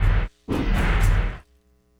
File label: Elektro Stop, Drums; Sonic Foundry (Sony Creative Software) - Friendly Fire; Marc; Steve